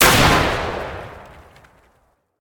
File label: Bomb; Game rip; MiSide